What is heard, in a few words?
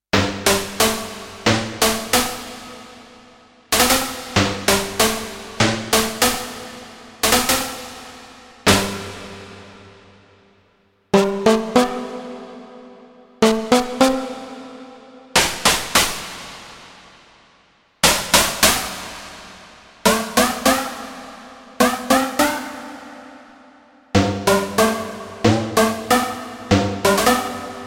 Slap Shot; Kawai K1; Bank 2 card A